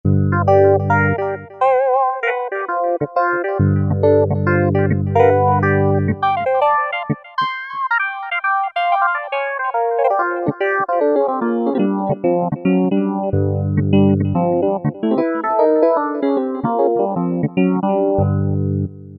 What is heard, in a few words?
Korg MS2000, EP Fusion Ld; Factory Patches